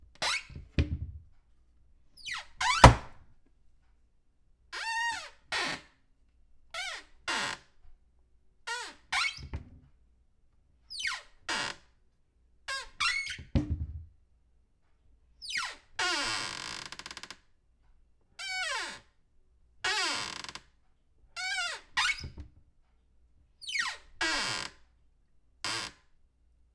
Door Kitchen Cupboard Cabinet Open Close Squeaks Various